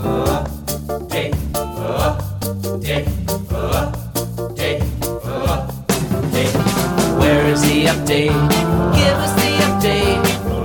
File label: Update Song; Pocket God